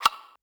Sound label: perc; eddyflux